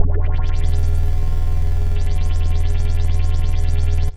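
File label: synth; Evolution 074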